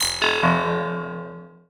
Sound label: TECHNO FX 140